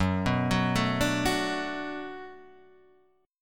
D 6th Fsharp, chord

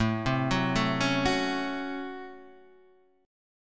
Db Major 7th Suspended 4th Sharp 5th A

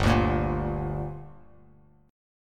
G Minor G, Bass Standard; strummed; JGuitar

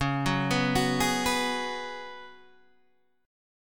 Db 7th Suspended 4th Db down Guitar DADGAD

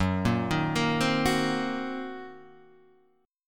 Fsharp 6th Flat 5th down Guitar Drop D

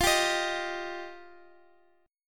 F 7th Suspended 2nd F, Guitar, Open D; x,x,x,11,10,13

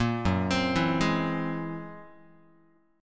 F sharp 9th Flat 5th F sharp Guitar; arpeggiated; JGuitar

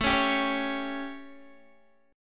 A 7th A, Guitar DADGAD; chord

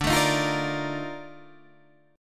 A sharp Major 11th D

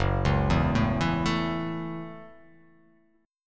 F sharp Minor 7th F sharp; arpeggiated; JGuitar

